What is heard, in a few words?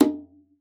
CON TONE; Xsample VOL-9